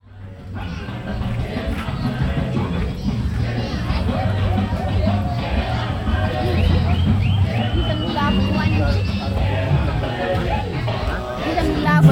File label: Ambato Boeni Nightlife